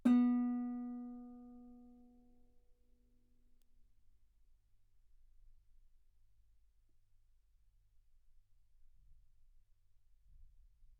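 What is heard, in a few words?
Guitar ff B string B3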